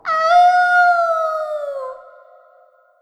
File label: Awoooo echo; Momiji